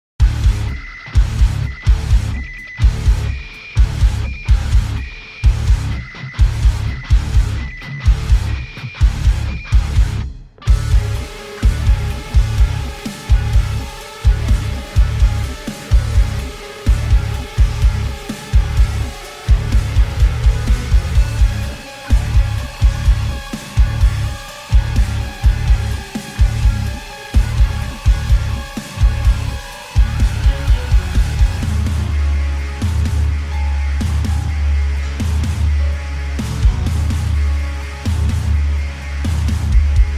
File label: extreme bass; Terraria Calamity